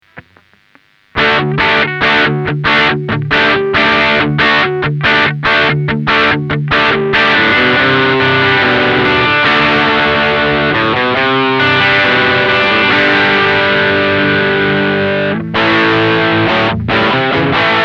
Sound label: LP R8 PRX908 Amplug Classic Rock Crunch